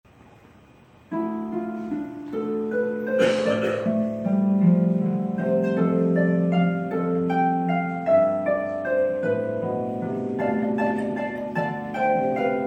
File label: harp